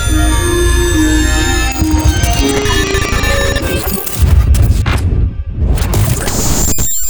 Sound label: machines